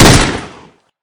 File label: smg fire; svencoop; rngremake